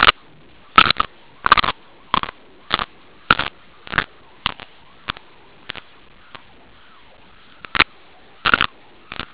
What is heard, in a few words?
crunch